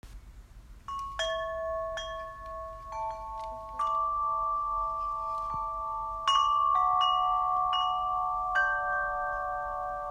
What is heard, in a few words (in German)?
Klangspiel Yoga